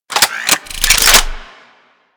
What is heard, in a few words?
Polearm Equipped; Warframe; Weapons; Corpus